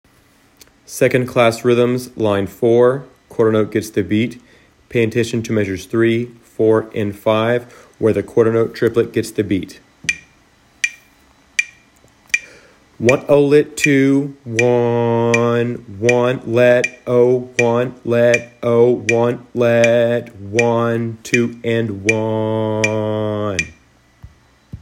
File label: rhythms